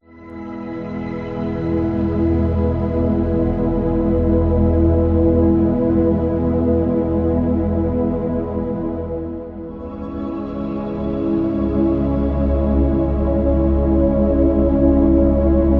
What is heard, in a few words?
Relaxing Sleep Meditation